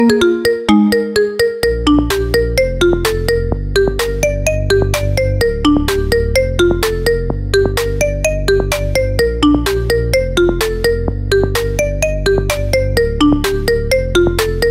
marimba remix ringtone

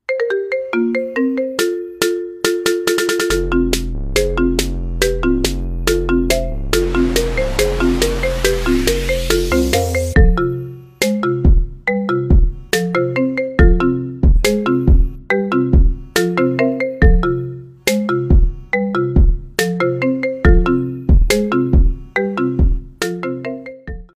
marimba ringtone

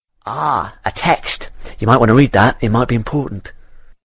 important text ringtone

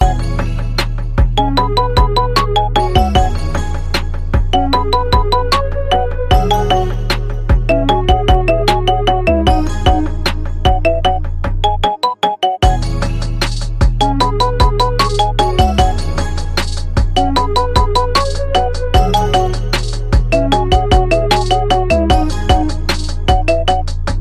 iphone 11 pro max ringtone

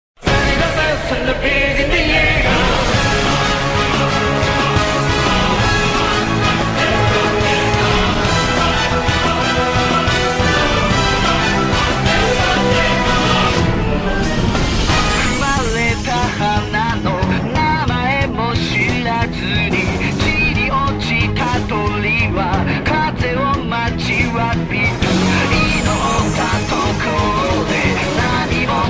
opening ringtone; attack on titan

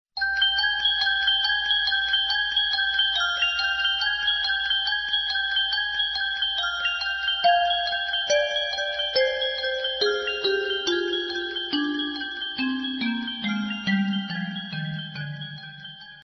cute sms ringtone

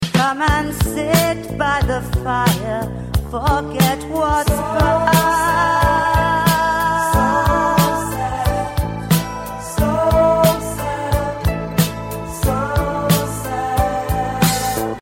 all time classic ringtone

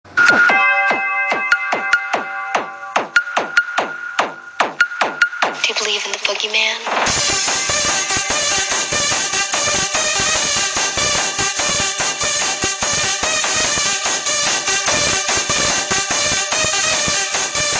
halloween dubstep ringtone

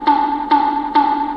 anime dots ringtone

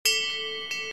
temple bell ringtone

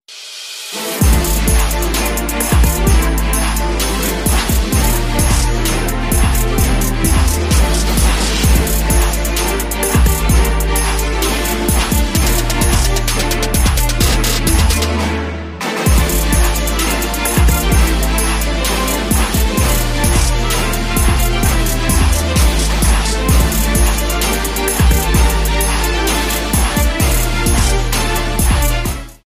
trap remix ringtone; pirates of the caribbean

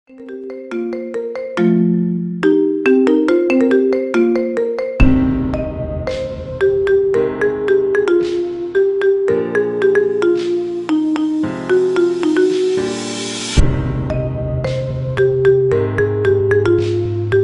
marimba remix ringtone

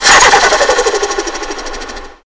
turbo flutter ringtone

